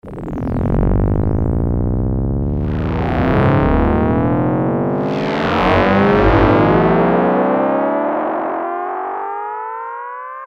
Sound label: GRAND PRIX; Yamaha DX7